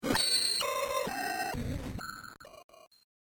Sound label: Scrambled Radio Signal Tuning Static; Scifi Technology; Noise; Electronics; BBFX